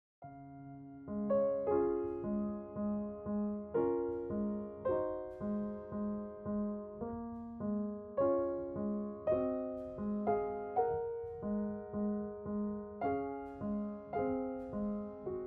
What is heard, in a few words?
Prelude in D flat major, piano; classical piano favorites from the romantic period vol 1